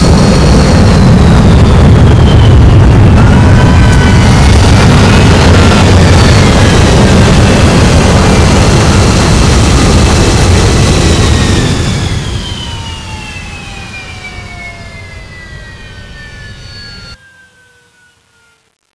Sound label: dual dropship fly; svencoop